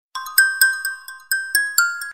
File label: iphone 4s sms